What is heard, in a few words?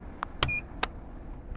Button Sound